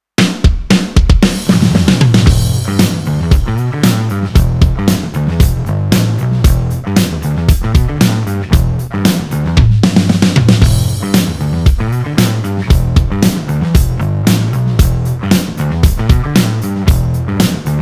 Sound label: Bass